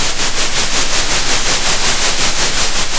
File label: center, Sound of a train